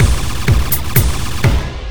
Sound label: Processed Beat, Drums & Percussion